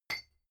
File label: hammer rock hit